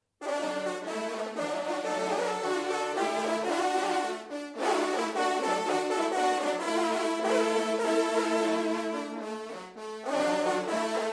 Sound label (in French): Clocher Dampierre Jazz, trompes